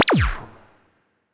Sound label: weapon debuff muzzle; Stellaris; biogenesis; weapons